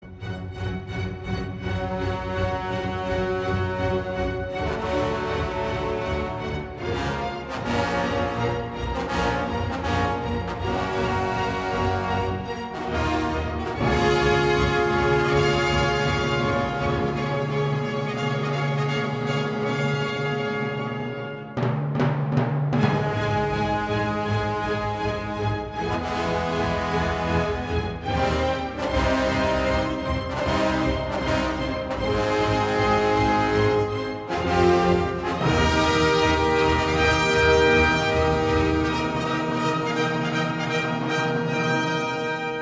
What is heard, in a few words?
RingTone